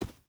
footstep boots sand run; Rust

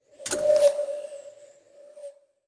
hint; World of Tanks Blitz; GUI

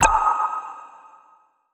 torpedo; World Of Warships; weapons

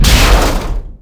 Damage Gear Breakage Ex; DCS

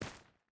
footstep barefoot stones run; Rust

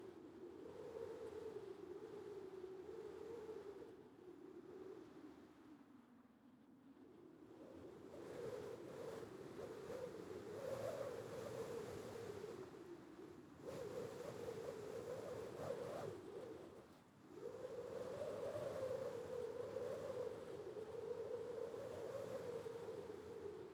ambience arctic breeze; Rust

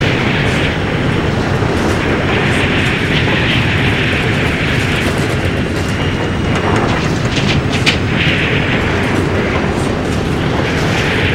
amb airplane; ambient

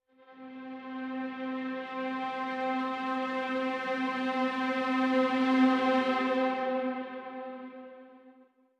music interactive; World of Tanks

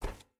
footstep burlap metal walk; Rust

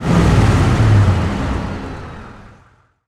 Shilka Engine Acc End; DCS

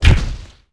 Hit Knight; Civ4 warlords

